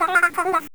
Voice Cat; Princess & Conquest v0.20.06

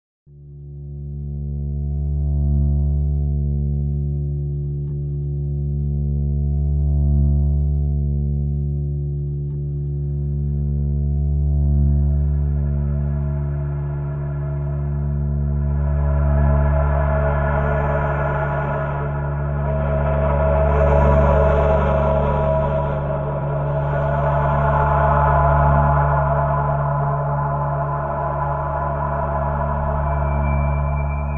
Telescope Ambience; Bionicle; Mata Nui Online Game Soundtrack

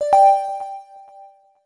power hint; Audiosurf; cyclone

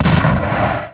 missle; Insaniquarium; popcap

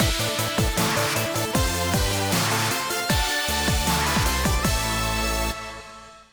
Cup Victory; Sonic & All-Stars Racing Transformed - Original Sound Version

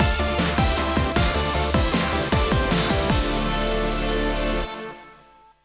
Jingle E; Sonic & All-Stars Racing Transformed - Original Sound Version

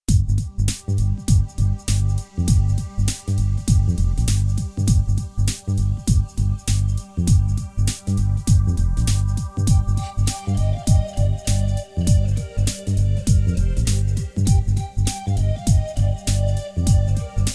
Jungle Vibes; Newgrounds

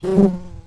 Bee; Princess & Conquest v0.20.06

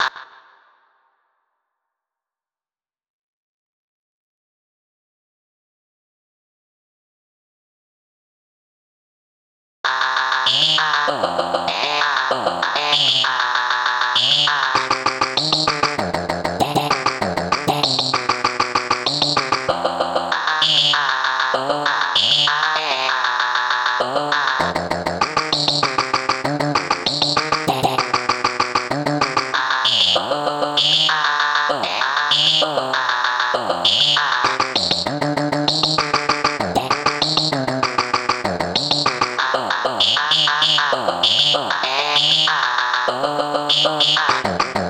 vocals only

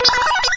gold key; Junkbot

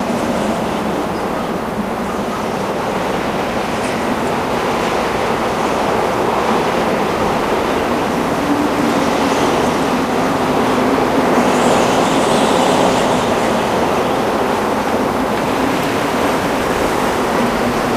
wind; Drunken Robot Pornography; Inago Rage

